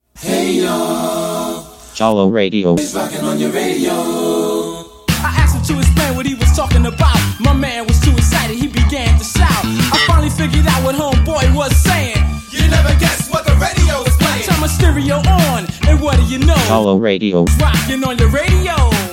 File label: ident